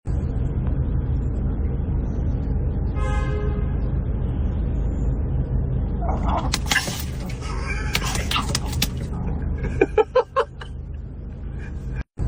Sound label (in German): Hunde Ehe